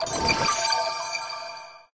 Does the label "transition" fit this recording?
Yes